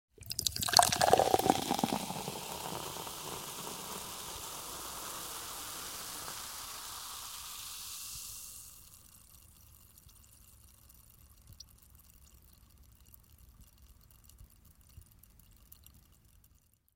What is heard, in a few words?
Soda Pouring Into Empty Glass w Fizz, Household